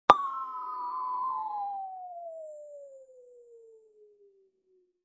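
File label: very soft object fall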